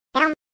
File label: yoshi mlem